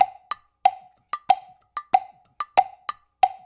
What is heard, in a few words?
percussion loop; InVision Interactive; Percussion Slam; PROGFROG